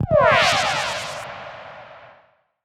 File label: Zap, PsyTrance